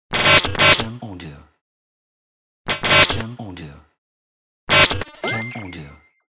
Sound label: Glitch